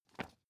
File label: magazine page handle